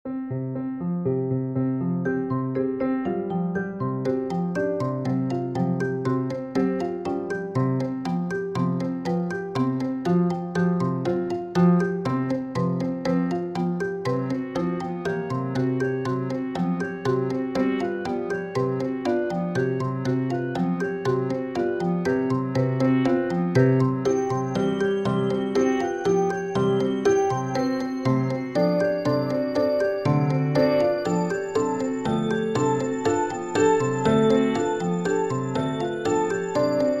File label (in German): Instrumente